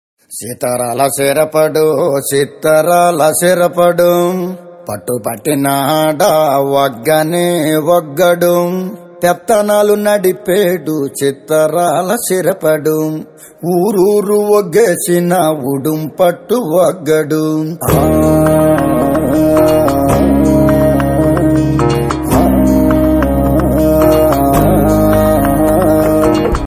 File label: Folk Ringtone; Ala Vaikunthapurramloo; Telugu